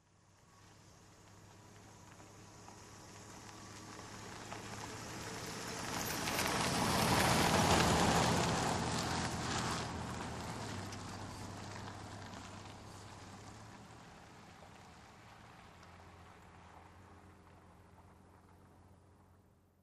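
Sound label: Car, By Slow, close up On Wet Gravel.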